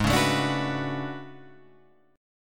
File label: A Major 13th, guitar